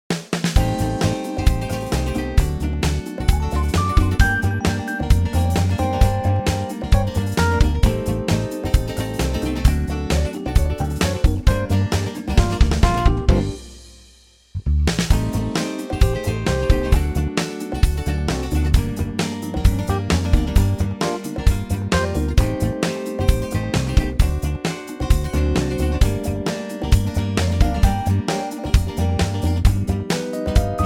smooth Bb; key - Bb - vocal range - F# to G; Super smooth and funky arrangement of this cool old standard!

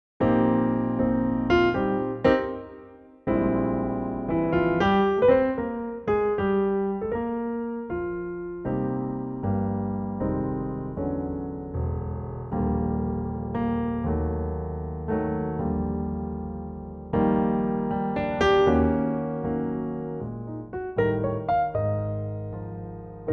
Eb Piano

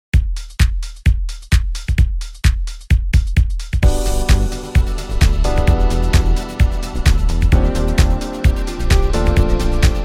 G smooth

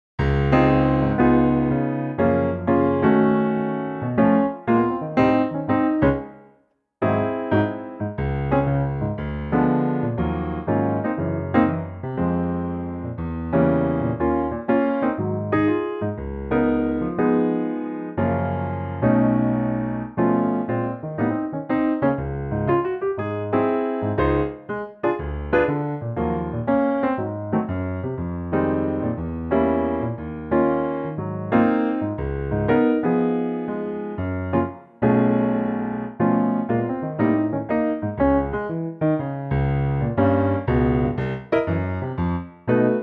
Db piano; key - Db - vocal range - B to Eb

